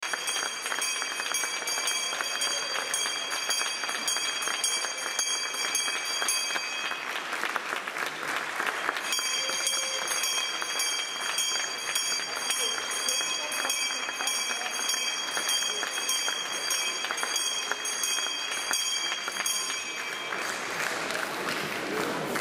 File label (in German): atmo boersenglocke nach boersengang